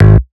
bass staccato; osu

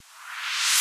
Reverse

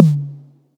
TOM

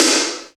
boom

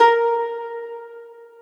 harp

